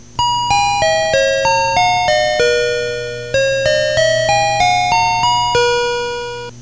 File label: chime; ACE・ISL肉声チャイム; kintetsu